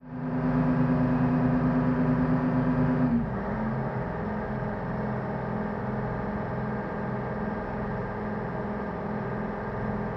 Military Pbr: Onboard Cruise Steady, Various Speeds. Dual